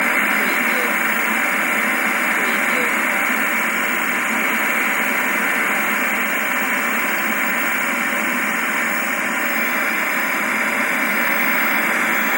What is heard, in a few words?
TV Static